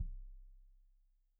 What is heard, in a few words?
Kik Stereo; Deeply Sampled Drums